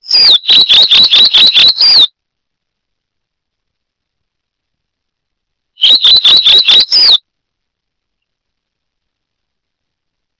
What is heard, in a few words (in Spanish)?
Polioptila dumicola - Tacuarita azul